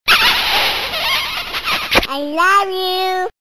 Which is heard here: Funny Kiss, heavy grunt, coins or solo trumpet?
Funny Kiss